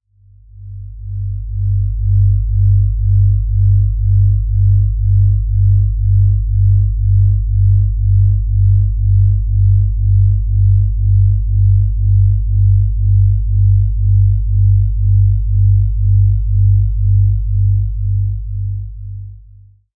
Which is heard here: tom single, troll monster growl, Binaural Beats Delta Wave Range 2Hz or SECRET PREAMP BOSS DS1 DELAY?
Binaural Beats Delta Wave Range 2Hz